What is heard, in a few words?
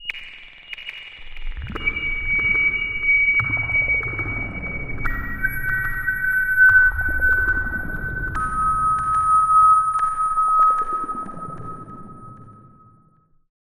ATMO Extrasensory Waves; Synthractive; syntheway